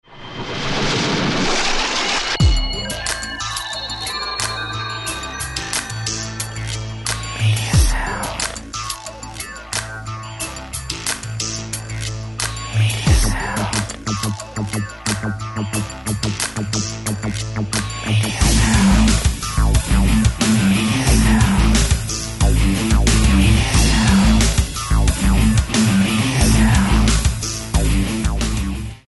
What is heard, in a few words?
New Dawn - 90BPM, Rock